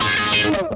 RASP, Funk Guitar; 90 s Sample CDs; Zero G

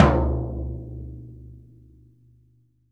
P.F2TOM MP, VELO TOM

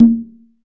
percussion congas

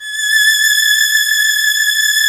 STR LUSH, MIDI Section; Roland L-CD702; Combos 2

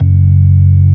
ORG B3, organs; Sound & Vision; Gigapack I CD 2

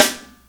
JAZZ SNARE; E-MU Formula 4000 Series Vol. 1; Hip Hop Nation

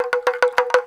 Kenya Ceremony; Planet Earth; Digital Sound Factory Planet Earth

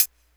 hihat; Big Fish Audio; Hardcore Breakbeat Action; Shake That Booty

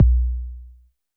Kicks, Elektron MachineDrum; SPS1 MKII

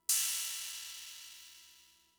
Cymbal; Fricke MFB-522

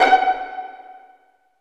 STR PIZZ, String Sections, Violins Pizz; Vls Pizz; Roland LCDP13

